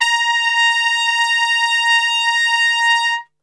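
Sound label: A#3 piccolo trumpet, Brass; Best Service; Brass Super Section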